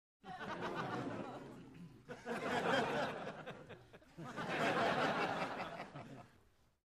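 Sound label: Crowd 100 Short Light Burst; medium int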